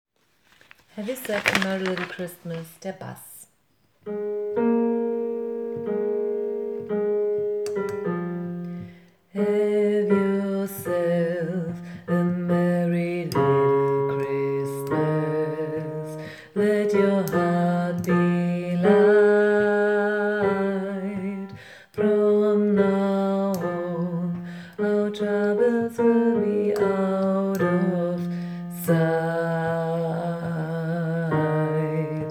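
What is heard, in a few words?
bass, gospel choir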